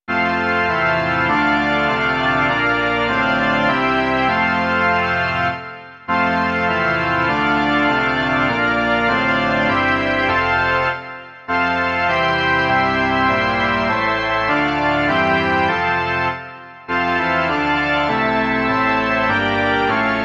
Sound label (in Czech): varhany